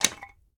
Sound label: Tile Use; Game rip; MiSide